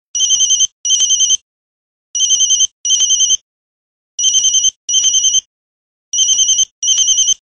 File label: ringer; ringtones